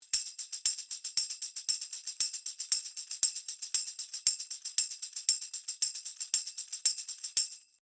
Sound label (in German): Tambourin 50cm; Schoeps CMC1 MK4